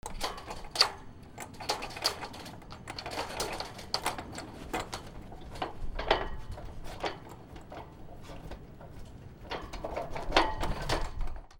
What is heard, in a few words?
BOATS